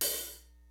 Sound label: LIVE AC KIT, DRUMS; Kid Nepro; Elite